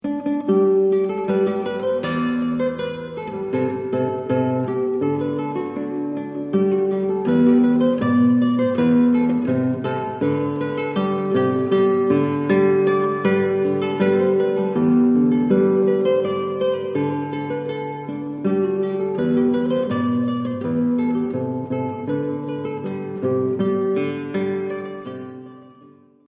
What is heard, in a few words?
Simple Gifts, solo guitar